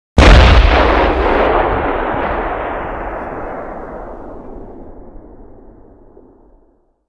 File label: Vehicle Weapons